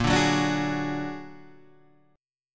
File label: A sharp Augmented 7th, Guitar Standard; A#+7 strummed; JGuitar